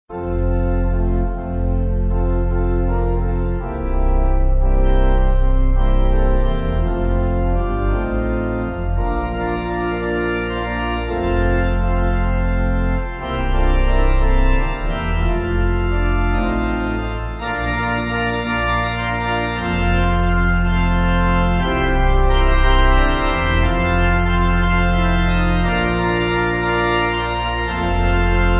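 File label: Pipe, Pipe Organ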